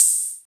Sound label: muted open hi hat one shot c sharp key